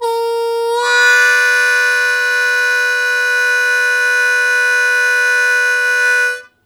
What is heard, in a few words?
Blues Chord Attack E1, Harmonica; Zero G